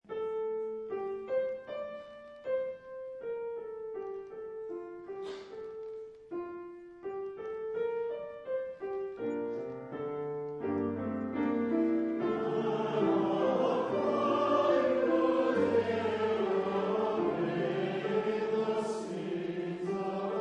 Agnus Dei SATB; Colloquium

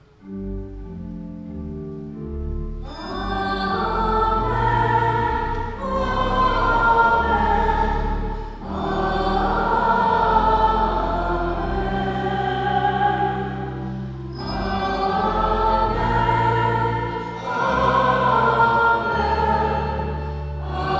Amen; StJ bells

